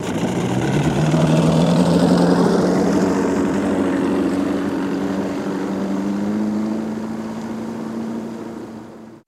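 115 Hp Evinrude Away Drive, 115 hp Evinrude Boat Drive Loop; 115 hp Evinrude Boat Away Drive Away From Dock, Mono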